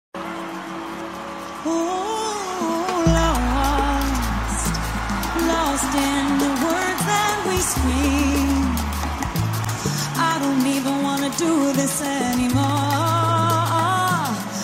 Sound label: Salsa Version